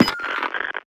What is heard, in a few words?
out of bounds popup, user interface; gmod; mw